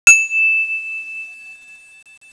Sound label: chime